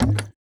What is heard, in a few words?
Exit Stroke